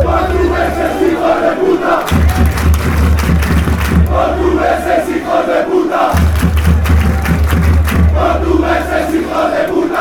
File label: portuguese, fan chants